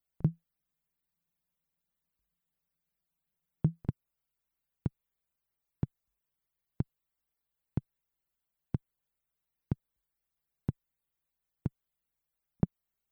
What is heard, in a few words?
MFB Tanzbaer Mid Tom Conga